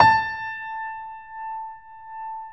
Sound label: GRANDP ST A 4 F; USB Soundscan vol.17